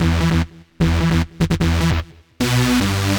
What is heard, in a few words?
future rave samples, 150bpm, E